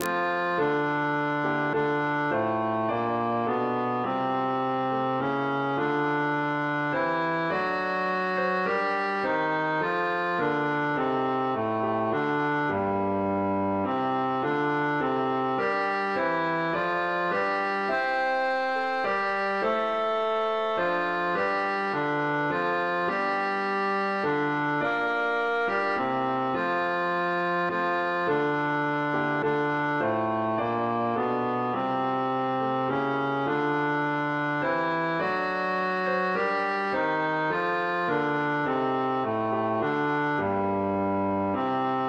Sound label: bass I